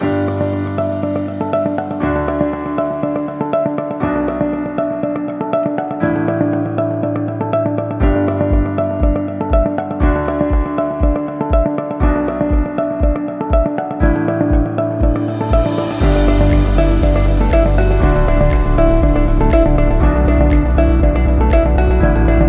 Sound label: Education Loop School; explainer